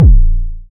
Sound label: small reverb kick drum a sharp key; kick drum samples